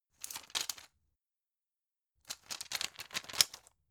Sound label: plastic blister handle